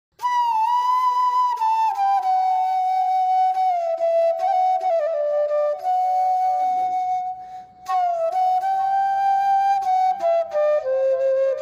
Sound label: flute cover short music for ringtone; mobile